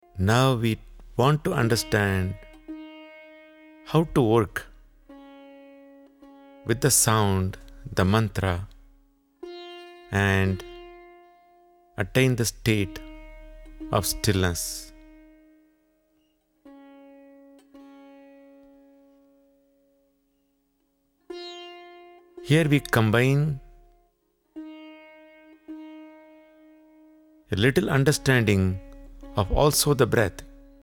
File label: Mantra Meditation